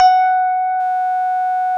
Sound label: piano RHODES, E-PIANOS; Sound & Vision; Gigapack I CD 2